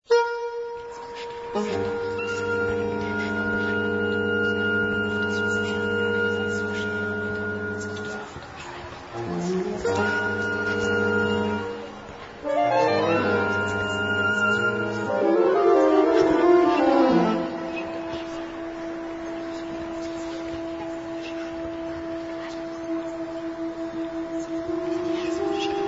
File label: saxquart; für Saxophonquartett und fixed media